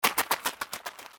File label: run gravel